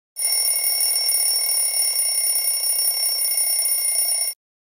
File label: Alarm Clock Sound Effects